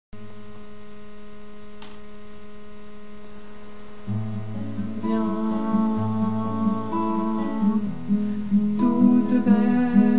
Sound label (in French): ténor